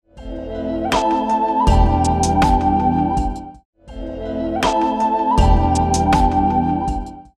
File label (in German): knacks